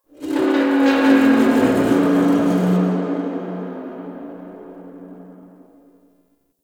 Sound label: Stadium Metal Creaks Close; Warframe; Ambience; Nineteen Ninety Nine